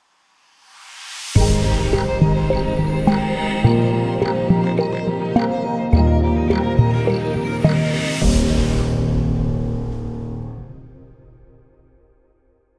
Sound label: Podcast Intro; sonic